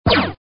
laser, alien; Alien laser gun 2

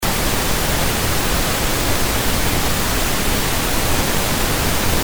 pink noise

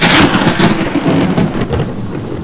thunder rain

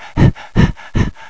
pant

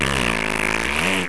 raspberry

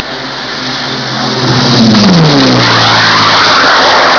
griffon, planes

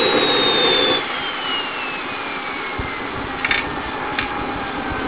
jet stop

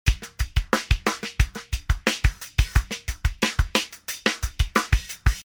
drums phase crunchy

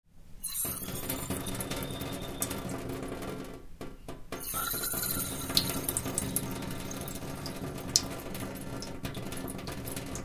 Stainless Steel Tub Running Lightly, water

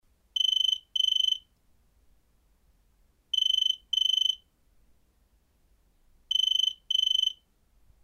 Phone

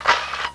cameraclick

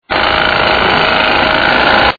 jackhammer

